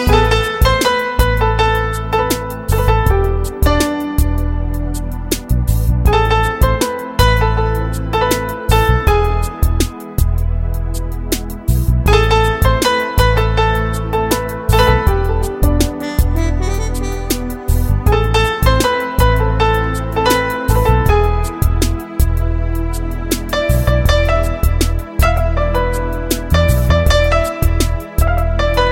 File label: Instrumental